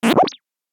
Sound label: fast turnaround; message tones